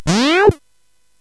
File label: SFX; Narco Police; hvsc